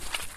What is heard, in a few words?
mud footstep; everness